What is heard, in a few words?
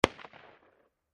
M16 Short Barrel Mach